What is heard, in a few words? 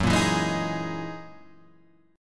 F sharp 13th F sharp Guitar Standard